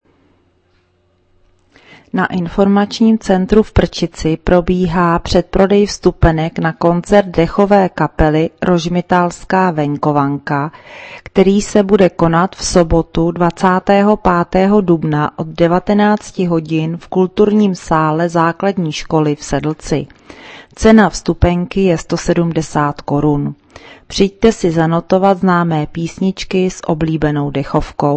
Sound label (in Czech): dechovka